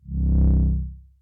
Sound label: powerup; Incantation